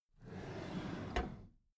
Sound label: packed drawer smooth clatter slide open